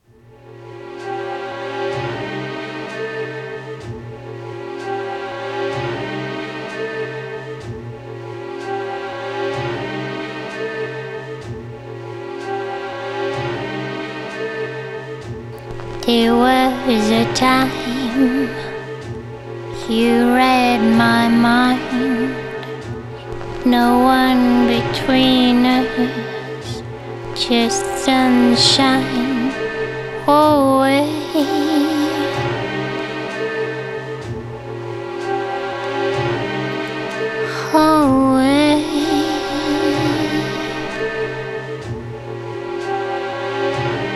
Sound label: midsummer night blues